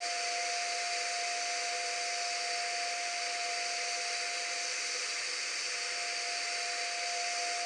AT TURBL F, jet atm; Total Control - Action Cutz

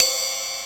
RZ-1 + LOOPS

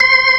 ORG, Organs

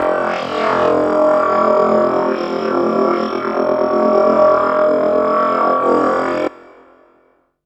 FX, Drum & Bass

